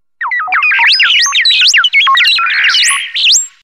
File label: Space Sound